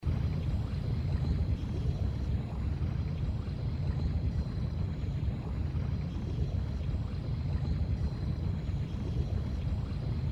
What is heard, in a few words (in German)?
u bott fahren; inside